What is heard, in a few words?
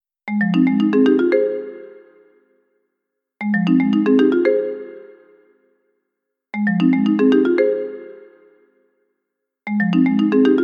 cell phone bubbly bubbles